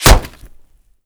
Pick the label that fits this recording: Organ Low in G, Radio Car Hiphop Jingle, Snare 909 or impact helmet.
impact helmet